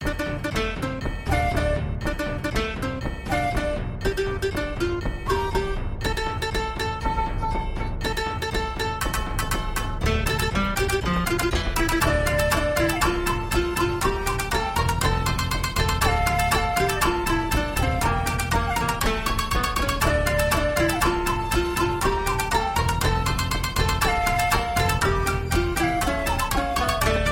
Shamisen Koto Shakuhachi Percussion Timpani Tabla Triangle; ShamiKoto; Bassesland; Syntheway